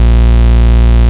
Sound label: Root A#, Ultimate Bass; Vengeance Samples